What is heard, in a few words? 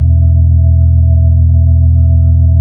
B-3 Chorus